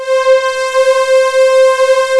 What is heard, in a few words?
MIX STR C5; MTXSTR C5 MH